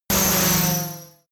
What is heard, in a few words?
sci-fi laser shot